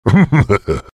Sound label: laughing; night wanderer v2